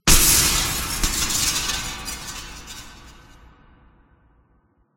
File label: glass; golden freddy; Five Nights at Ronald's